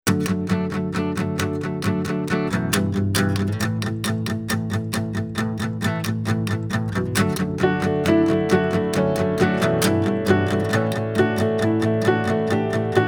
jingle

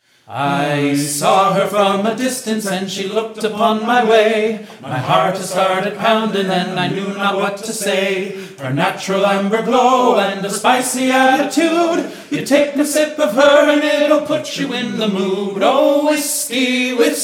an irish drinking song

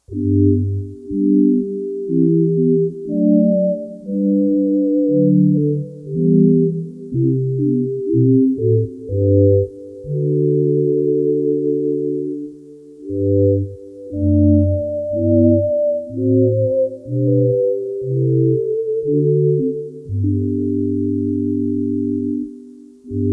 bach chorale

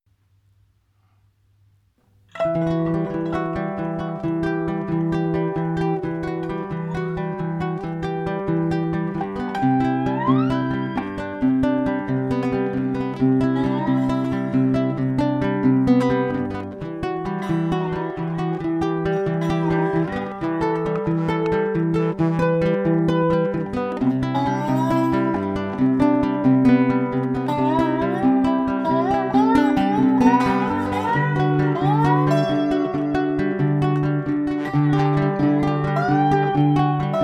four guitars

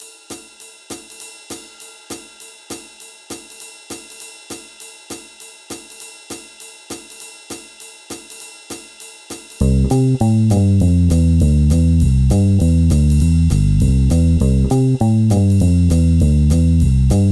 compujazz

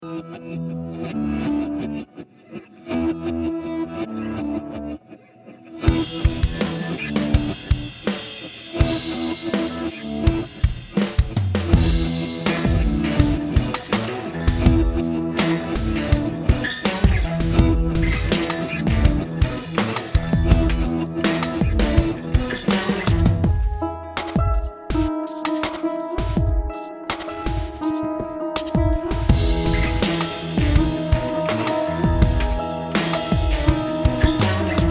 drones instrumental

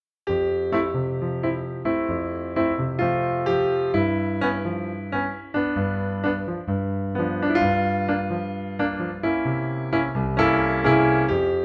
a fing piano then the ambient comes in

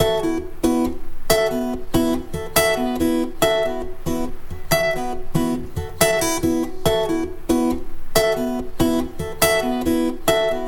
more pouring rain

